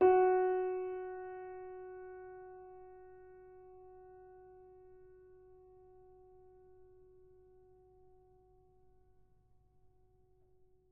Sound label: F sharp 3